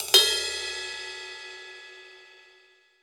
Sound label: Pop Ride Phrase, Cymbals; Roland BK-5